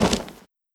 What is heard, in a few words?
Barbarian Cloth; Legend of Keepers